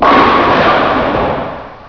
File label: Hitting Pins; Alley 19 v1.11